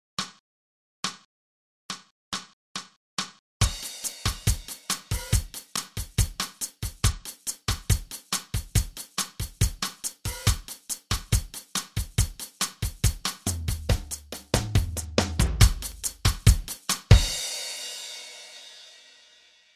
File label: Drum Track